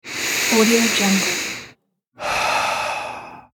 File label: male deep breath in and out